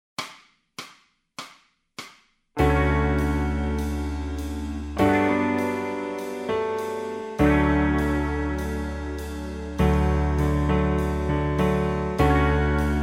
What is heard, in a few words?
bass scales, backing tracks